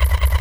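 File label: Ambience Sci-fi Energy Loop; SFX Kit; Sci-Fi Loops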